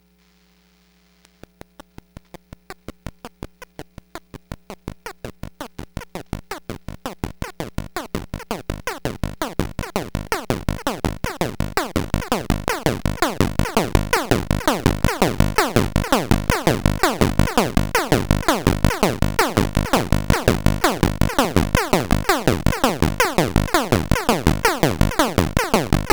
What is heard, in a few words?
2A03 pulse pitch volume